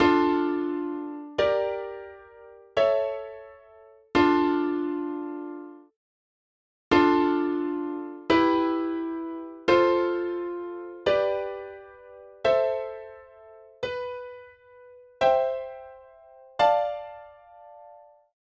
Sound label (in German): Scarboro Fair Dreiklangsmaterial